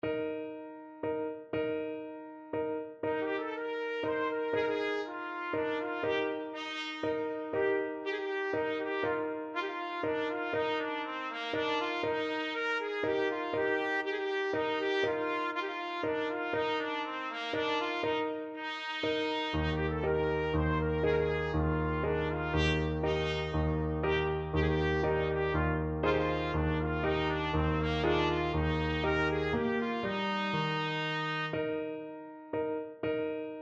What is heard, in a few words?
trumpet